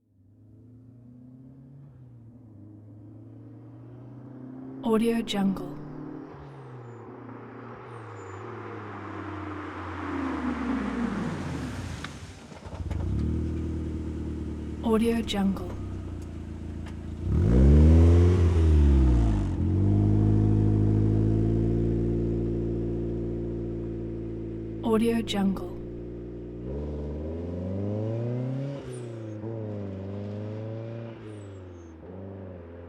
turbo racing car approach stop reverse and pull away; hollywoodedge